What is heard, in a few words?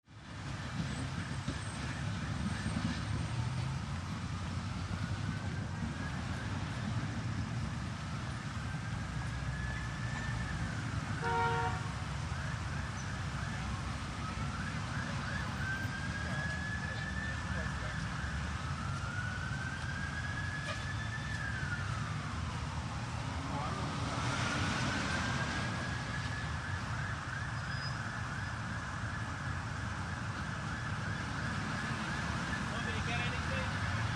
Busy City Sirens; big city